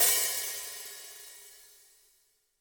HiHat & Crash, Roland TD-20; HiHats

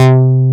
MOOG, Source Bass

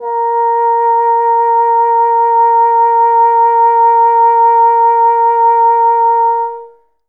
Bassoon A#2; Best Service ProSamples vol.51 - Classic Orchestra 2

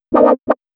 120 synth licks C, Keys, Nu Jazz House; PowerFX

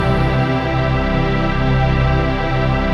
CHRD PAD; Best Service; XX Large Pads; Sample CDs

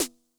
SNARE DRUM High Tune Low Tone, 909; classic machines; 909mod

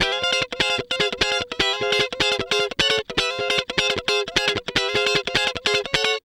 Guitar Licks; Best Service ProSamples vol.17; AKAI

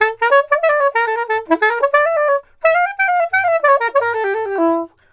SAX, Balkanish Traditions

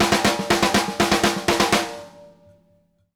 snare lick, drum fills; Sampleheads - New York City Drumworks VOL-1

